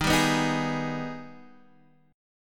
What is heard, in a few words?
Ab Minor Major 7th